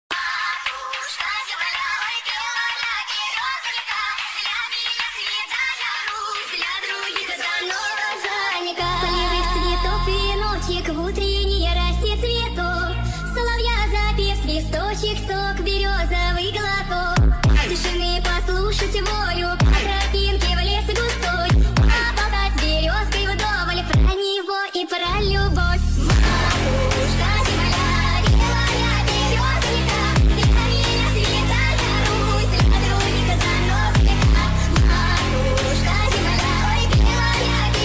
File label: ultrafunk phonk